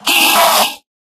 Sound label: scream, endermen; mob; Minecraft